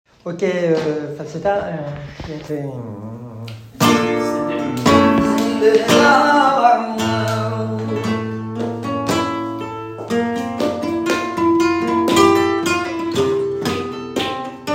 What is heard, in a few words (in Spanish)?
falseta solea; falseta solea final tableau 2